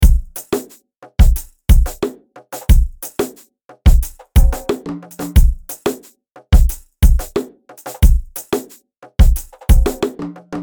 Ethnic 90BPM

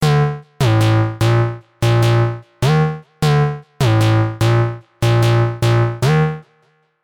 Bass Exit

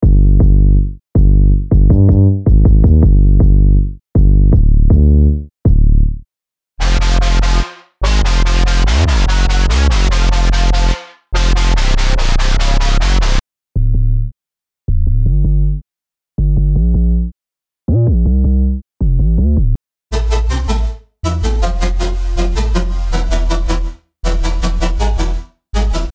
Future Bass Melo Trap

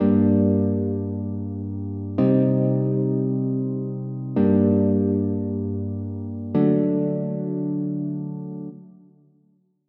Chords Full Ethnic; midi wizard

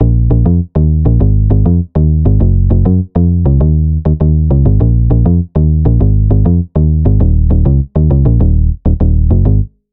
Granted Bbm 100bpm

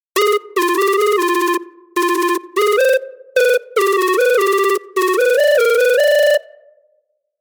Melody Lead Dubstep; midi wizard

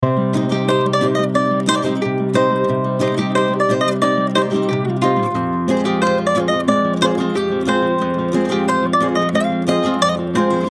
180 BPM Cm; Motocicleta

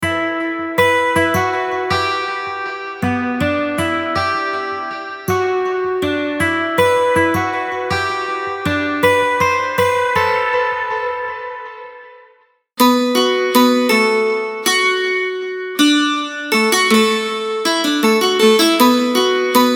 Melodies Country

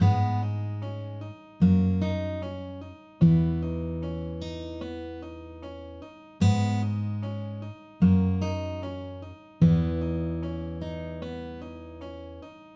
Guitar

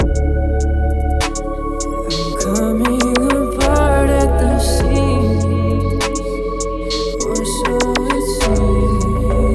Full Beat RB

